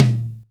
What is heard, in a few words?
Toms